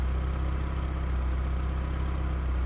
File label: engine loop; reverse gear, engine sound; gutter runner